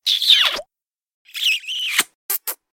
kisses

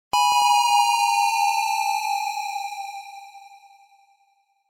closet fall; deltarune

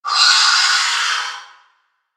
screech

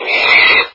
pig

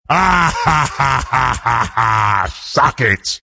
laugh

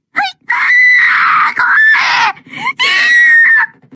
scream; ayame

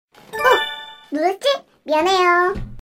cute

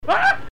aagh

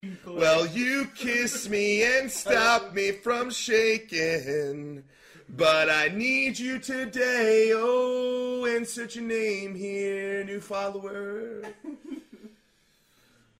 follow sound

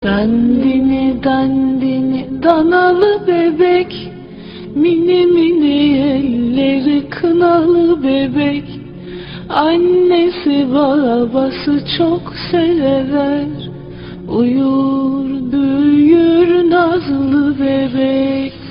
dandini dandini turkish cartoon soundtrack

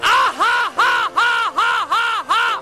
laugh japanese; tidus

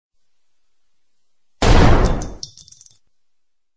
desert eagle

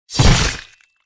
goofy button

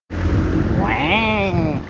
weee

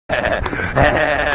hehehe; ice poseidon

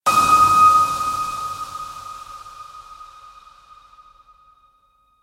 roll

